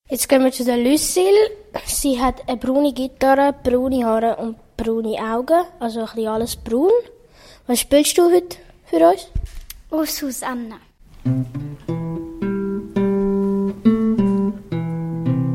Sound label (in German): spielt Gitarre; Oh Susanna